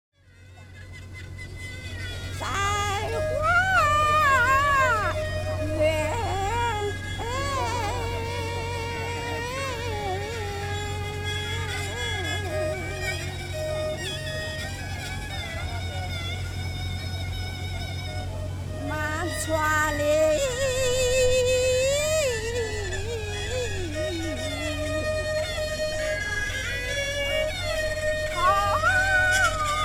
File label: Street Guilin Opera, China; Les Cartes Postales Sonores; NOISE ON EARTH Vol6